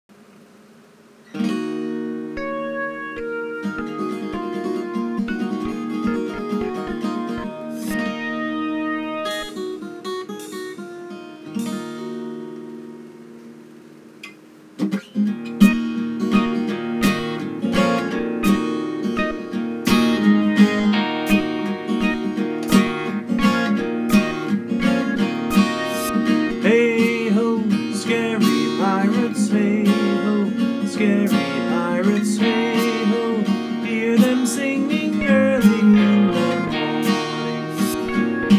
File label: sea shanty chanty song; the pirate